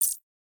cancel, button

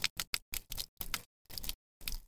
blood drips

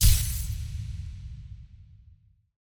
item get, anime

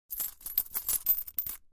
purse in

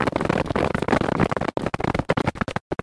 many people stop

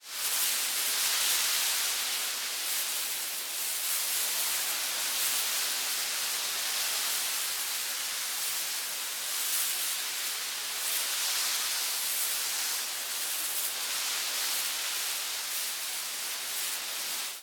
plants swaying

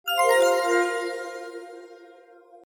presentation title, anime

